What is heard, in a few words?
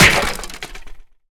bat Cut; HeavenStudioPlus; lumbearjack